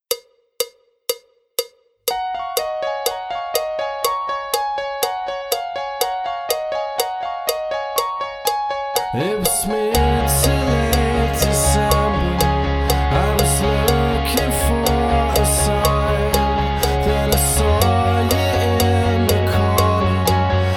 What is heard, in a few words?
backing track with click